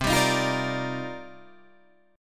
C Minor 11th C Guitar Drop D

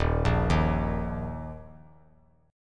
Eb 5th; arpeggiated; 3,5,6,x; 3C,3F,3A,3D

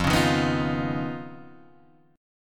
D sharp 7th Suspended 2nd Sharp 5th F

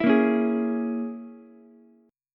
A sharp Minor 7th Flat 5th A sharp, Ukulele; strummed

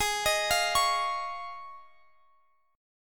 F Minor 7th Sharp 5th, G sharp

